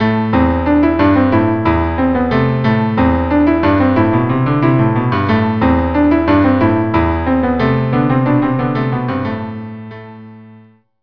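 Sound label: Freylekh cello cello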